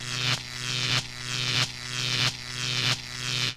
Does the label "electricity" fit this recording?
Yes